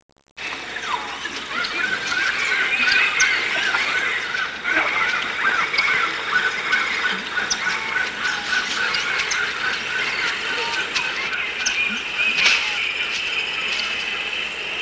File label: midday, forest